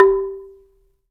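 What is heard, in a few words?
AFRICAN MARIMBA G 4; Heart of Africa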